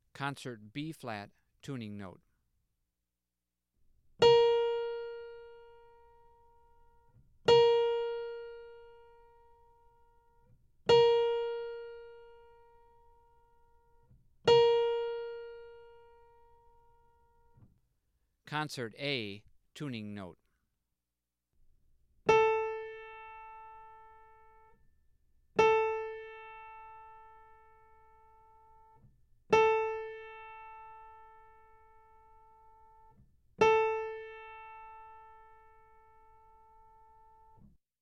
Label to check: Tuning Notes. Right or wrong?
Right